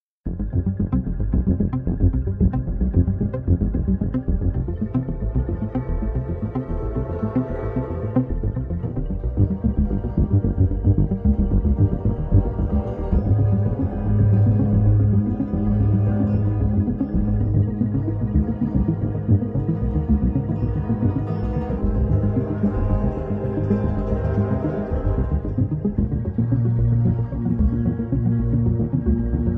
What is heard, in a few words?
Action full loop